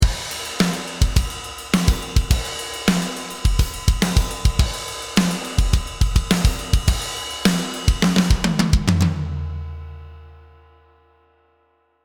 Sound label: Thrash Metal Groove 5 - Processed Wet, drums